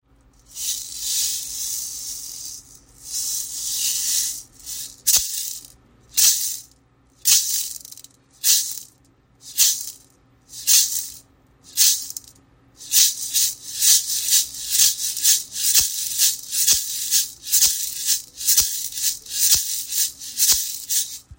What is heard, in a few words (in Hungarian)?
maracas kezi csorgo